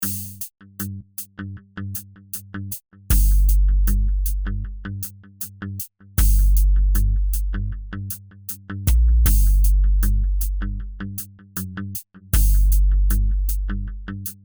Punch BD HipHop tuned several

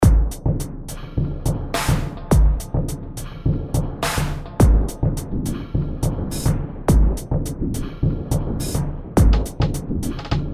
Punch Film Score & TV; Punch Filmscore & TV example 5

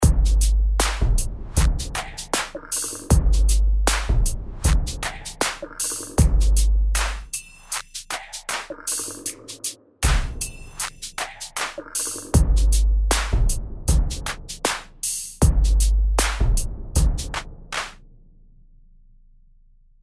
Hip Hop; RP Punch

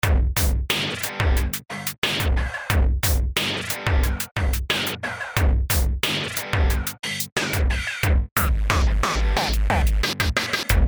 Punch

